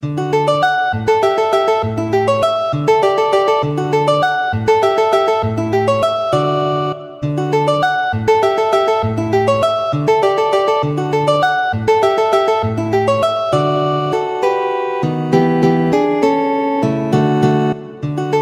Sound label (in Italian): valses, guitar